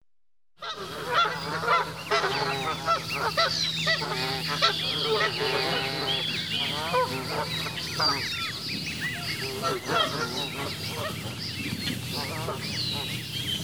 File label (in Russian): kanadskie gusi